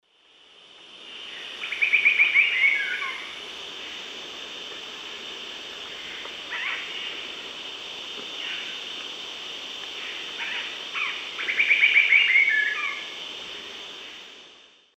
Dendrocolaptes certhia, song; S. America; Eastern Lowlands

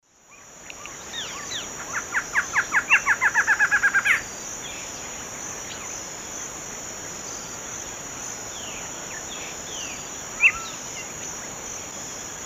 Thamnophilus doliatus, song male; S. America; Eastern Lowlands

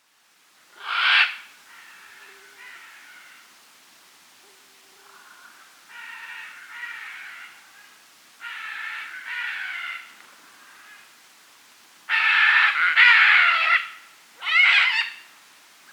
Red-and-green Macaw (Ara chloroptera); Bolivia, Peru; S. America

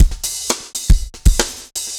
dancefloor tight 120; loops; 120bpm

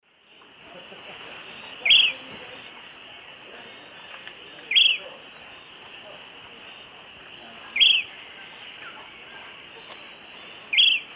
Myiarchus ferox, dawn song; S. America; Eastern Lowlands

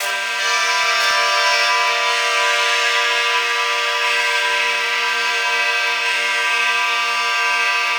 Fried Organ; Electronica; Textures; Fried Organ Load

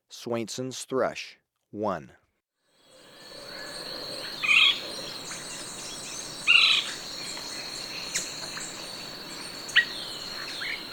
Swainson's Thrush; S. America

